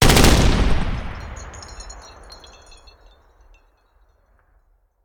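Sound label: Artillery Cannon Anti Aircraft; Sound Ideas; Guns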